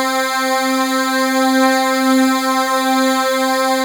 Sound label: RAW PUL; MKS